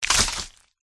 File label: scorpion attack contact mid